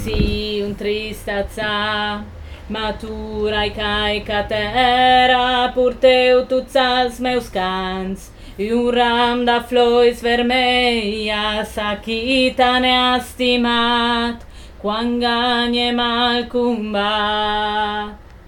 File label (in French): refrain tenor